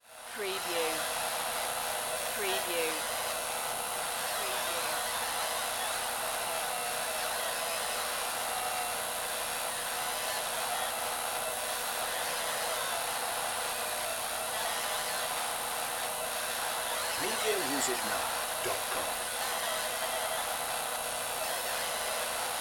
Spaceship Cockpit

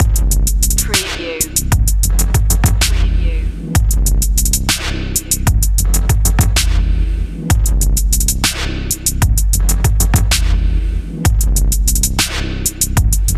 dubstep industries

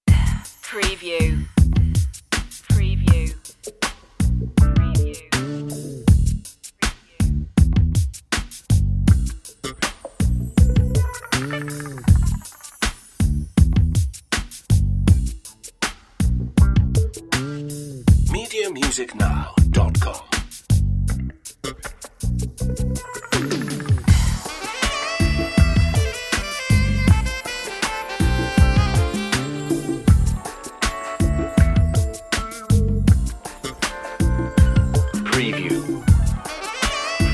sexy soul glow no synth

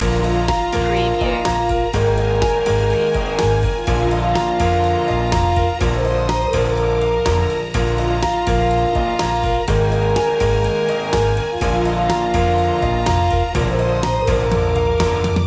daytime stroll loop

